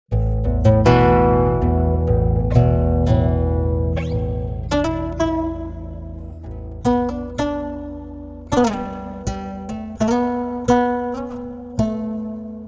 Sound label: guitar, pitchshift; prosoniq time factory mpex3